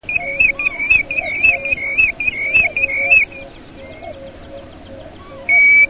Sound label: Pluvialis apricaria song